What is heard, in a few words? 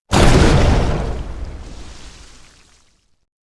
projectile hit; chiabihamaru; cv project; invading executioner; zerosiki; slender